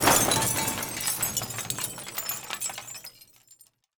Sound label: glass debris; bulb